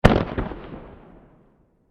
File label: Explosion Firework Close; Fireworks; Backgrounds